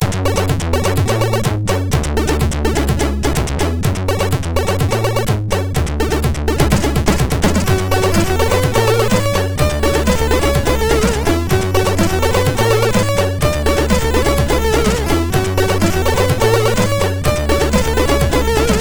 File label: Press Start, MOS6581R4